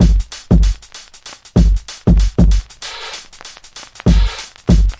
oldvinyl bigkick, drum loops and breaks, 96 bpm; 091 to 100 bpm

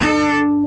orchestra string hit D

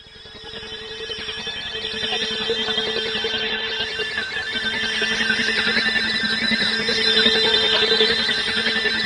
space telemetry

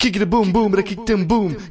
MALE SINGING

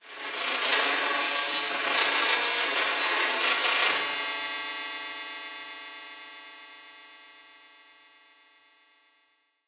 comb effects low; Electro and Synthetic

